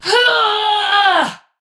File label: Attack Effort; Warframe; Tau; Dax; Ninja